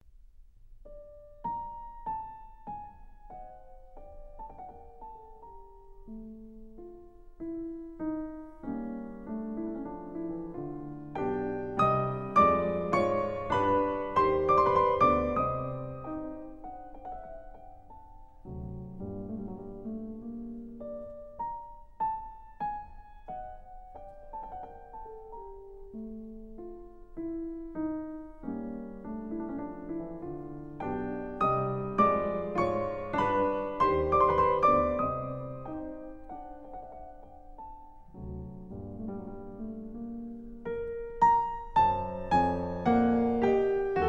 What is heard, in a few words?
Andante And Five Variations For Piano Duet In G, Variation 2; Mozart